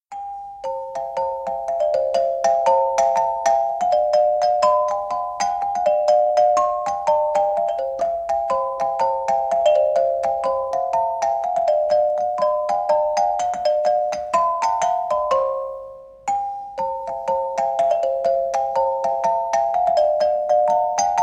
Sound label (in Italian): campanine